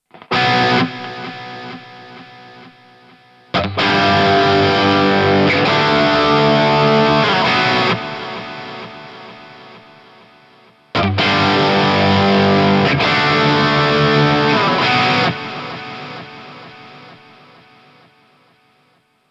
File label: Plexi Lead ping pong delay; Mooer GE1000